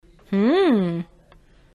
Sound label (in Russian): hm jenskoe